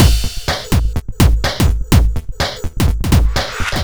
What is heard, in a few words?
125 bpm full, drum loops; Zero-G; Soundclash Nu Dance Electro; Acidized Wavs; Krakenhausen